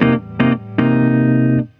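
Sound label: INDY guitar1, 119 bpm; USB Soundscan vol.32; Roots Disco; AKAI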